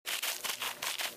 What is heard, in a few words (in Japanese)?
kusa